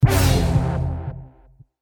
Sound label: item gravity orb skill